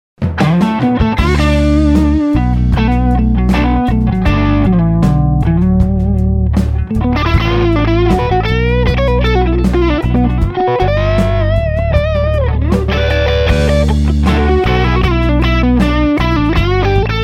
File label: Crunch